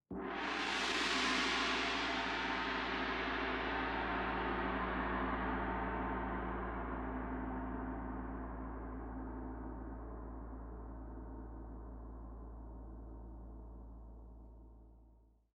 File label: gong